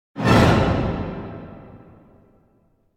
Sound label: Symphonic Hit Horror Appearance Emergence Chord; Hollywood Edge; sound designer's toolkit v2